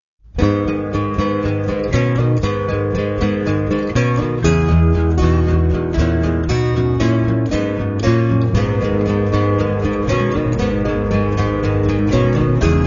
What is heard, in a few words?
acoustic medley